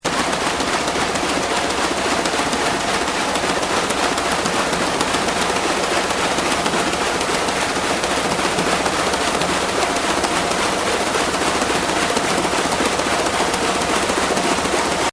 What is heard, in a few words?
Acoustic; climatide; noaa